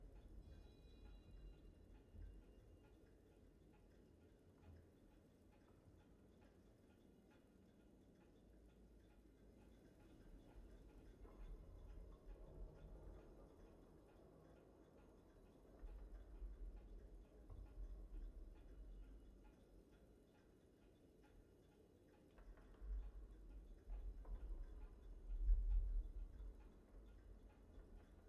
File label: amb record; jayce